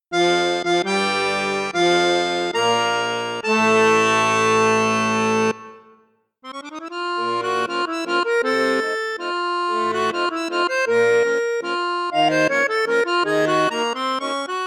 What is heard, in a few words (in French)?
registre basson piccolo, maugein mini sonora accordeon